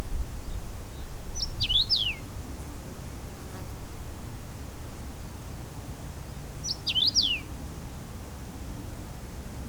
Braunkehlchen; Murnauer Moos; Ingo Weiss

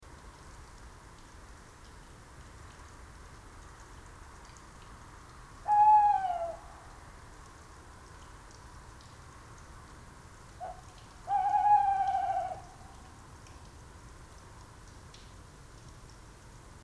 Waldkauz; Landshut; Susanne Rieck

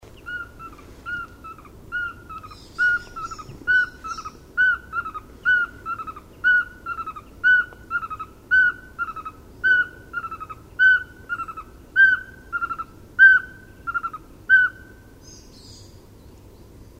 Sperlingskauz; Ruselgebiet; Susanne Rieck

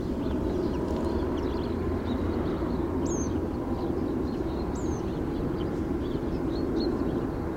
Rotkehlpieper; Landshut LA

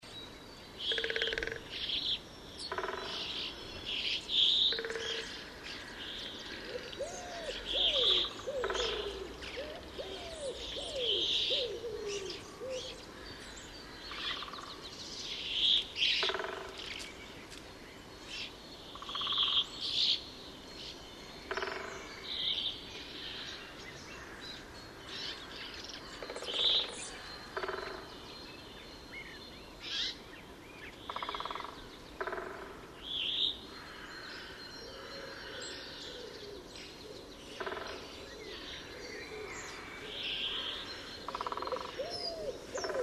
Bergfink; Landshut; Susanne Rieck

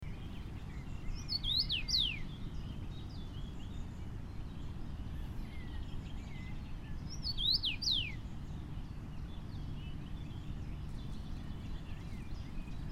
Karmingimpel; Essenbach LA; Susanne Rieck

